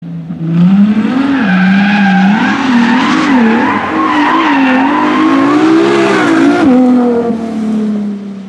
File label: ferrari laferrari burnout and drift